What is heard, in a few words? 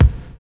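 noizy bass drum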